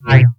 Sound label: EyeVoice; FX; Foundation Drums; Ableton Foundation FreePacks